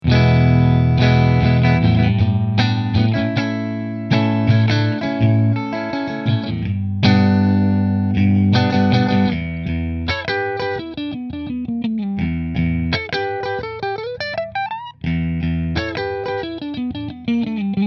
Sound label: Nola Model 8 Neck Middle Fender, guitars; New Orleans Guitars Model-8 Sunburst